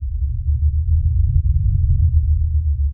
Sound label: burer tele hold